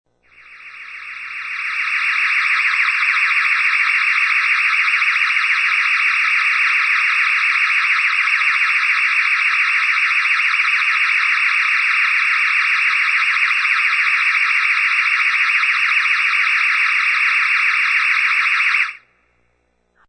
High pitched electronic sound effect of space ship hovering above.; Alien Space Ship